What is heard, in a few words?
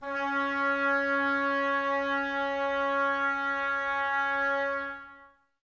OBOES C#, Wood Winds; Miroslav Vitous; Woodwind Brass Ensemble